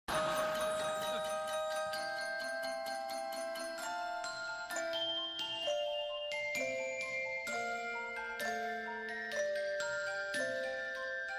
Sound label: BABY SONG; HIMYM